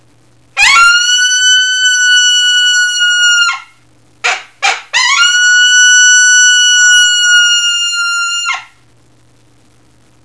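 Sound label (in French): Appel d'interrogation femelle, coyote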